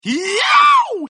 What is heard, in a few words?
win; bebop